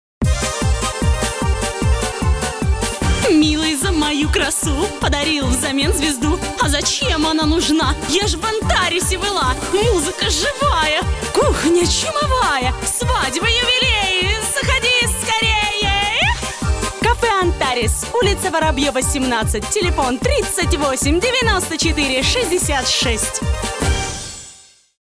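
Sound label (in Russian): музыкальный ролик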